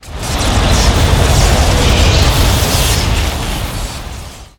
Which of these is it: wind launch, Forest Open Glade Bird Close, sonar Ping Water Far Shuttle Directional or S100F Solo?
wind launch